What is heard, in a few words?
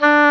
oboe dry; Roland L-CDX-03 Disk 1; Oboe 4-8